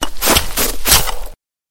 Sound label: monster dirt burrower